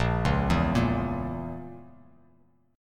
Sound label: Bb Diminished Bb down Bass Standard; arpeggiated